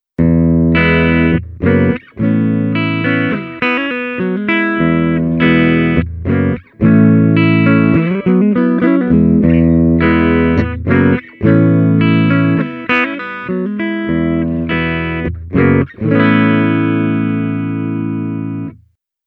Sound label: Bridge Clean, legend